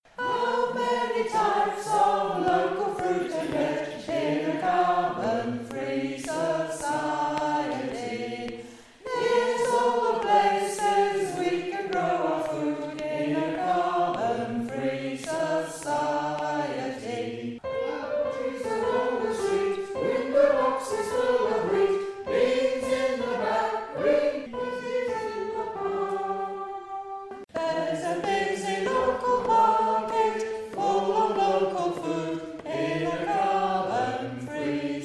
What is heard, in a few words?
In a Carbon Free Country Garden Tune